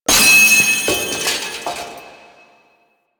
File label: Mask Knock Off; Deacon; Warframe; The New War